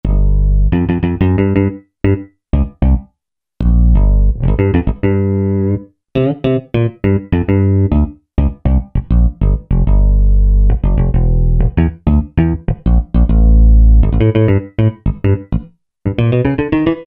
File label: New Pick; Generalmusic Equinox